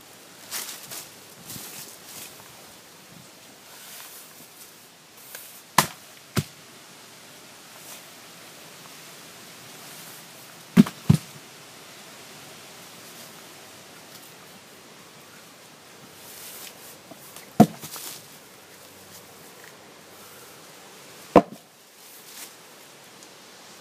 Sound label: Electrified Environment